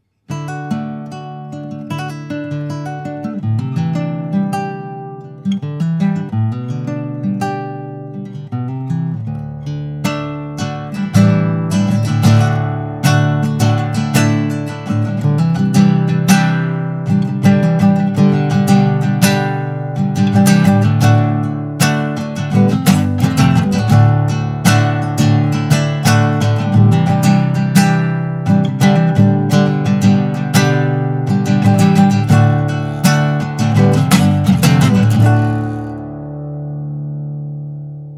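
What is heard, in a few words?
Guitar Sample 23d, Vintage Prewar Guitars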